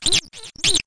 harsh whippleszoot

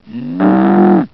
Moo

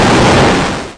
death, VIDEO GAMES; panic

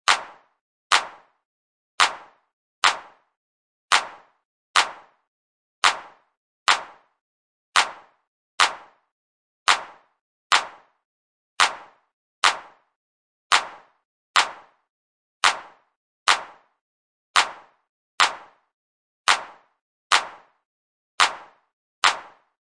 Clap; Optimism

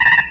tricorder

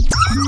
synth blobble zap

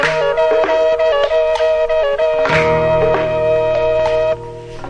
flutey ethno quartet; SAMPLED MUSIC LOOPS

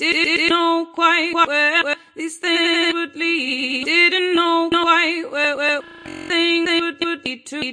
stutter fem vocal; MELODIC LOOPS; VOCAL OR VOXY LOOPS

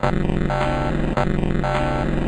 105 noise loop; 101 to 110 bpm

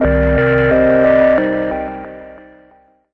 crunchy pretty synth

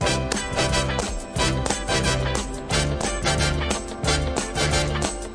090 horns; MELODIC LOOPS; SAMPLED MUSIC LOOPS

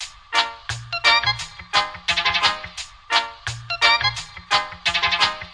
086 the real reggae, sampled music loop; SAMPLED MUSIC LOOPS

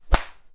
spank; Weird and Interesting Hits; single hits

